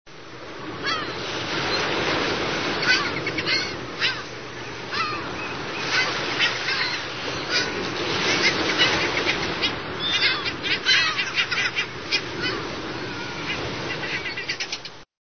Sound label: Heermanns Gull